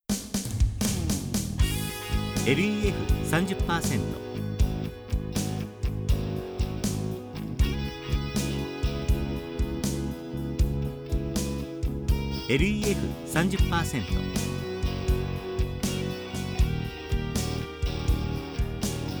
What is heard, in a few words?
rock